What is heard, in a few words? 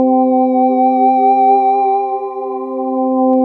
SYN K-2000, Roland